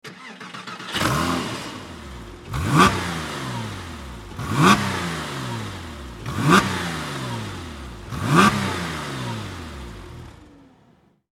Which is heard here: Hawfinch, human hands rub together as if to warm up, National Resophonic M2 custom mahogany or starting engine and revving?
starting engine and revving